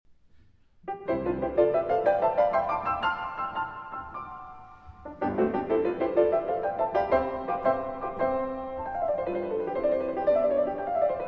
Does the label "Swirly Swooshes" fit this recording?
No